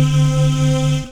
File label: Dance Mega Synth; Best Service; 90 s Sample CDs